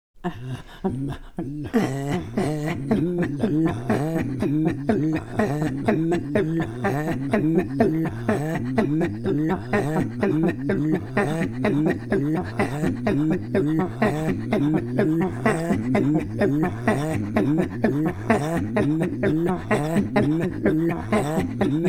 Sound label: Throat Singing, INUIT; Aha Amma Ullu